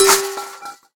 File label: pot hit; hellskitchen